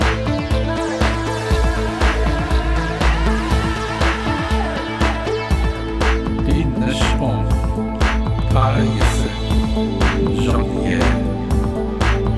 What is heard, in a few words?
Indian singing